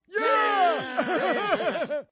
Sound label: Final Score Screen; spongebob; nickmaster